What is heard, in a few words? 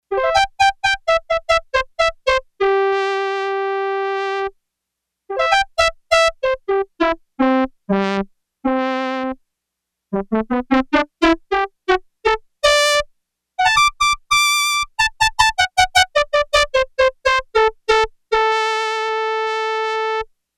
Trumpet A, Yamaha TX802; Factory Patches; Voices